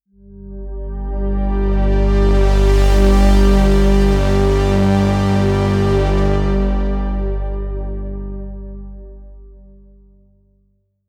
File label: pad